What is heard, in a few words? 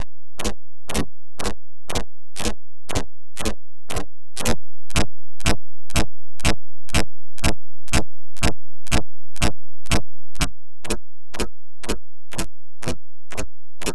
Rhythm